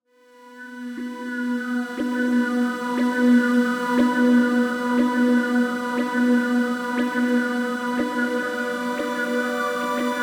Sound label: Pigments; A Dark Moment